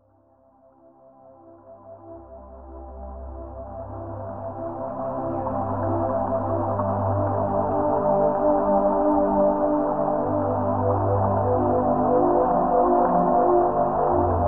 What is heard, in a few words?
Ambient Support